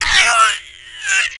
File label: death; creep; greevil